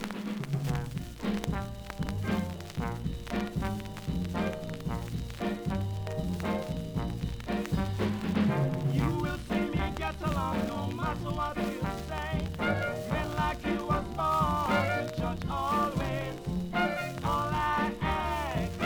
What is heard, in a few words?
Jamaica 60s Northern Soul Ska